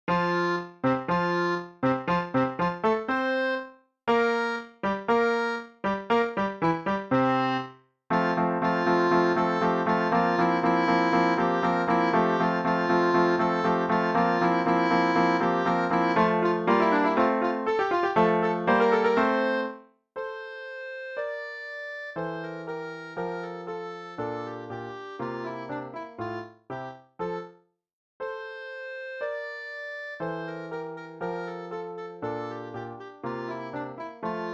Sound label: eine kleine nachtmusik clarinet solo